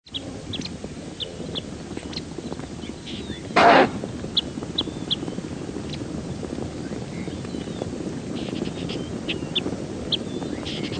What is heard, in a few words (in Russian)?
gazelle stado, zvuk gazeli